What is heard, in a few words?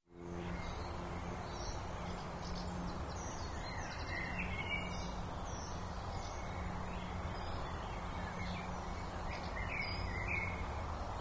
moms place - birds - cars; fieldrecs